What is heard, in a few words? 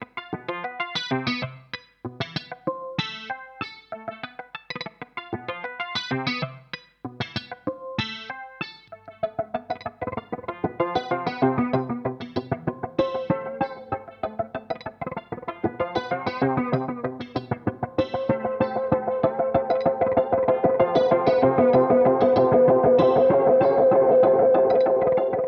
Volca FM